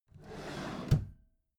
empty drawer smooth open regular

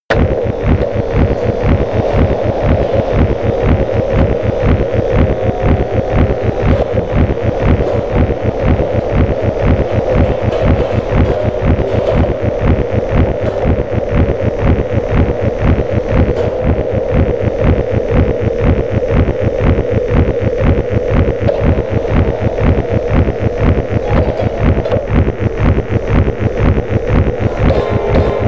atmosphere industrial hypnotic high

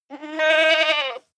goat baa male

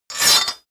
sword cut hard slash

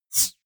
whoosh metallic synth short

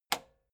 metal button spring push

